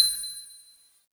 Collect star

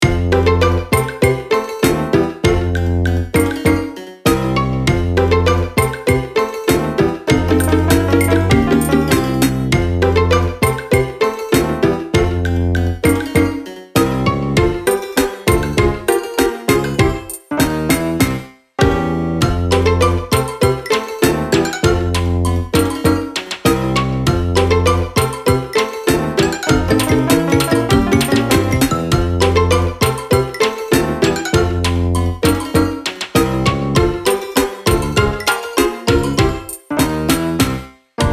BGM MUSEUM

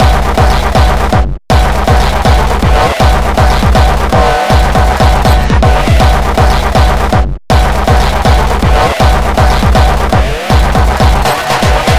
Beats Loop; Anger Foot

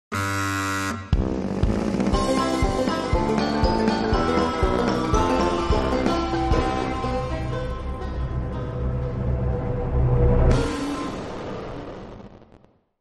Round Complete; porkchops horror show

